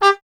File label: trumpet, G 1; Miroslav Vitous; Solo Instruments VOL-1